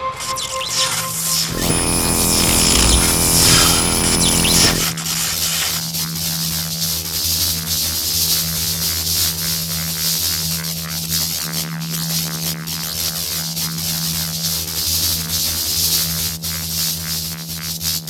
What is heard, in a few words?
core, star cores